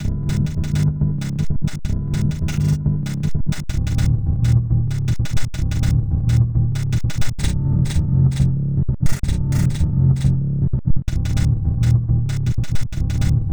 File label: hummer buzz, species bugs